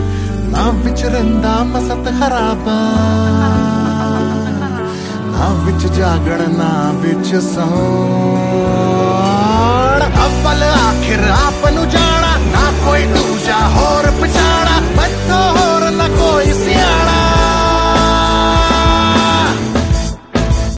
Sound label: hindi song ringtone, bollywood ringtones